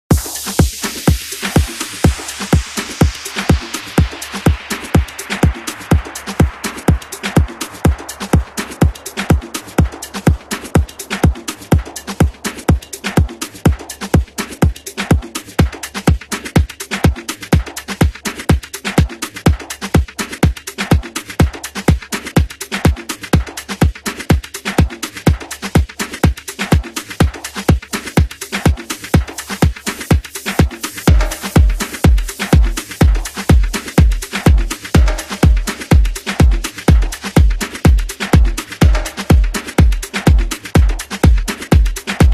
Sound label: afro remix